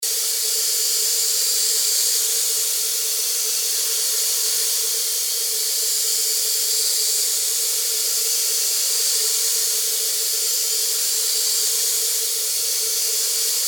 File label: gas streams warm sharp